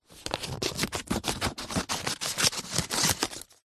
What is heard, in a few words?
office scissors cut paper